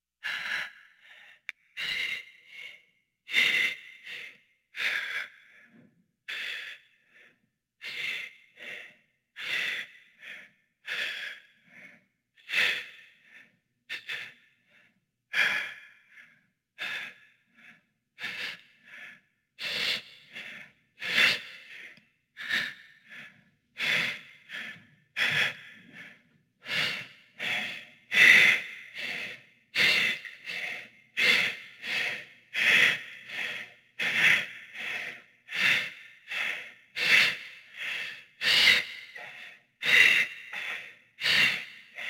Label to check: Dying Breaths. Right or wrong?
Right